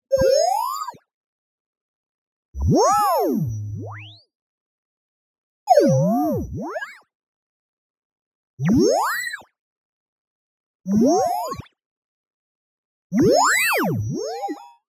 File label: tonal, noise filter resonance modulation low; boom library; Anime Essentials